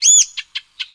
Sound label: warbler